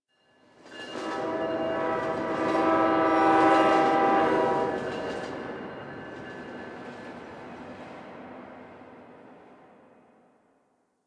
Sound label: Distant Train F; Warframe; Ambience; NineteenNinetyNine